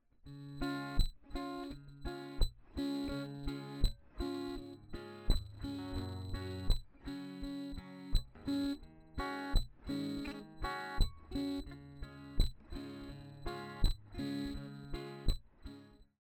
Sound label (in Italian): cassa; Chitarra acustica Martin DC1e; con colpo su cassa; freqbox moogerfooger; MF-107